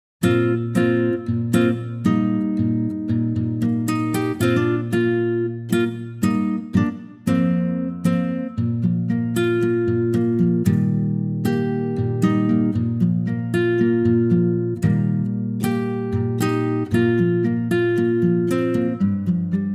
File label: ac gtr; Bb Acoustic Guitar; key - Bb - vocal range - F to G